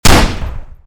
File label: grommod fire; STALKER